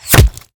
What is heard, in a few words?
bullet hit; STALKER; IWP